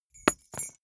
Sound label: key ring small drop carpet hard